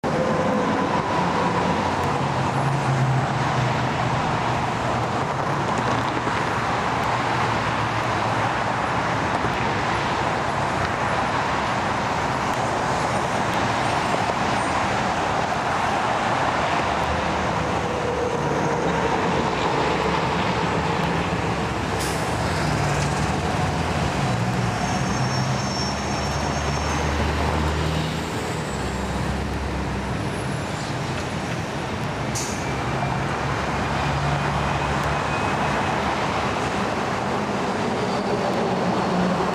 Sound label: traffic